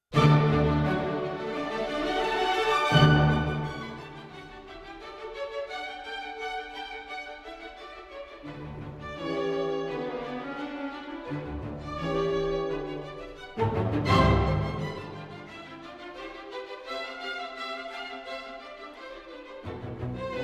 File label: neoclassical